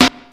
sizzly acoustic snare sound b key, acoustic snare sound tuned to the B note; snare drum samples